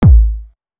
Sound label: hard bass drumz